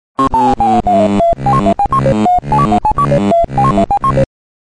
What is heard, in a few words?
pacman reversed